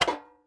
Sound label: lantern deploy; Rust